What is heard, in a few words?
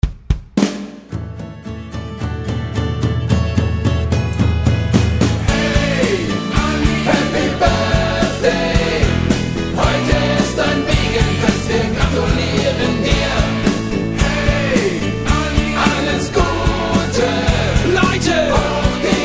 Happy Birthday Anni